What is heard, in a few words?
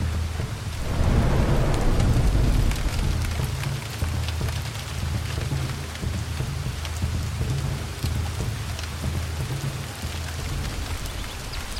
Jungle Rain; A Walk Through The Jungle Sound Story